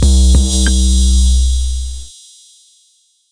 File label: two hit sizzle bass; Basses